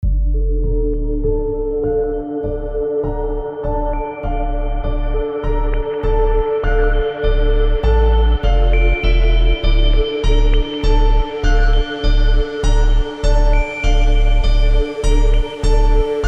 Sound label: Dubstep